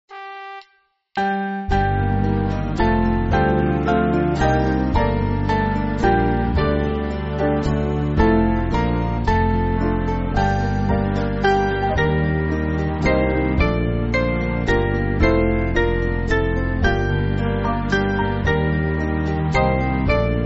Band, church music; O Sons And Daughters; O Filii

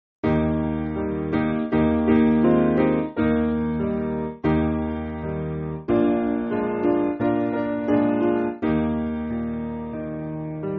Piano, church music

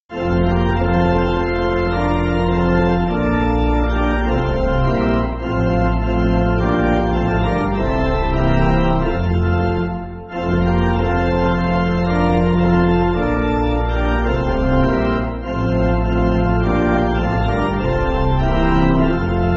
Pipe, church music

